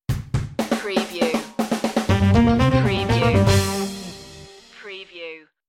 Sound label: funky moves stinger